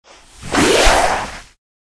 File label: Worm